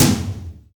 wet tom sound f key; tom drum samples

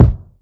phat bass drum sample F sharp key; kick drum samples

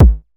old school boomy bass drum single shot a key; kick

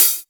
treble heavy pedal hi hat sample f sharp key; drum single hits one shots; hat samples

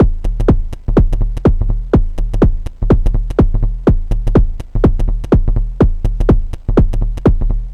kick and perc analog techno volcadrum - 124 em; drum loops

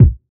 smooth bass drum single hit g sharp key

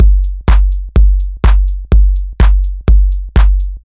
house techno beat passage, Gm, 125; drum loops

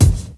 short steel kick drum G key

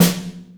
subtle reverb snare single hit f key

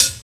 good closed high hat sample f sharp key; hi-hat; drum single hits one shots; hat samples

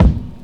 kick single hit c key; single hits one shots

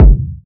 dry kick drum one shot g key

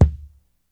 eighties tight lows steel kick drum sound d key

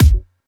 clicky kick one shot g sharp key; kick drum samples; one shots

